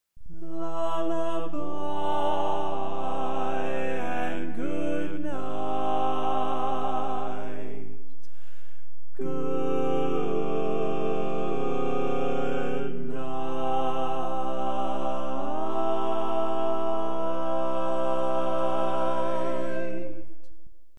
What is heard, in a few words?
Lullaby And Goodnight, Bari; Tags